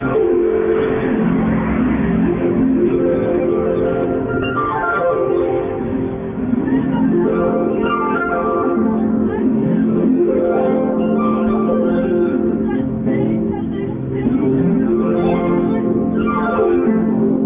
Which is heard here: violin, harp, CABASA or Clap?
harp